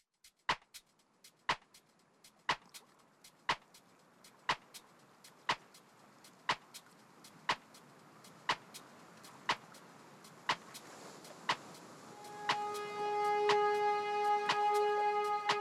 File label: Waves